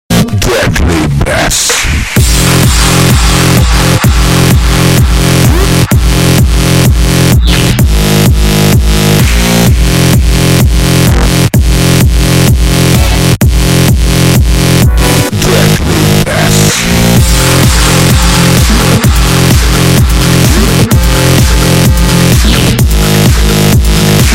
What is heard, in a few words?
Extreme Electro Tone